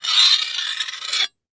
Horror Scary Knife Metal Scraping Metal

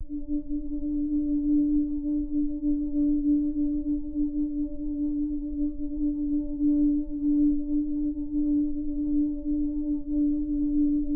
ATMOS, Cinematic Sound Effects; 99Sounds

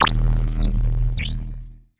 Transition Communication Distortion Scanner

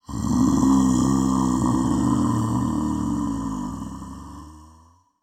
Horror Scary Demon Growling

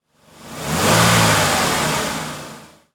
Jeep Commander Rev Short; transportation

